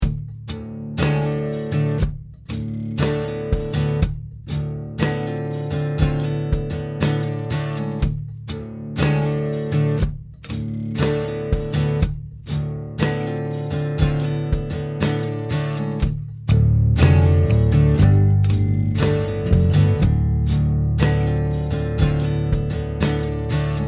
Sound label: warr guitar